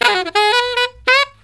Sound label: SAX, Killer Brass Riffs